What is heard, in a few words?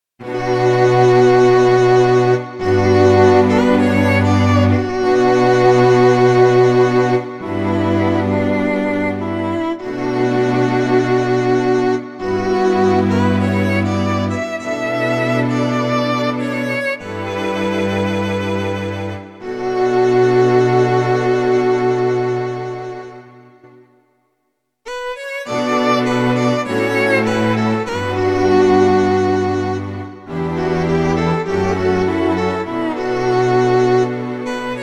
last summer; 余命僅かな女の子が最期の夏を大事な人と過ごしているシーンをイメージした悲しい曲です。